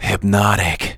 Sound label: vox; Ueberschall; Techno Trance Essentials